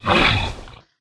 felidae damage, monster

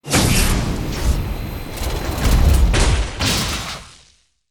death

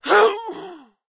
bloodylady damage, monster

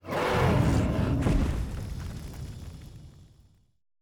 hellhound death, monster

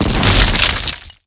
skill explo

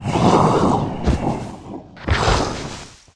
minotaurus lord dead, monster

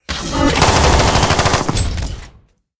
boss satk; steamer crazy

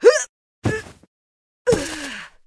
night blader dead, monster

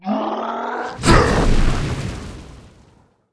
minotaurus attack, monster

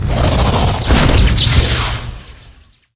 giant scorpion attack, monster

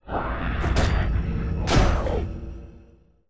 dark knight death, monster

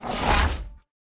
damage

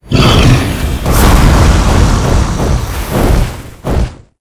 king peregrine

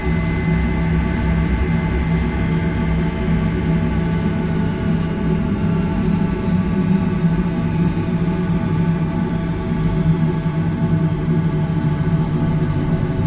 bike move

